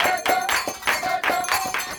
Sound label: CHANT, 120